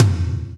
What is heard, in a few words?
TOM TOM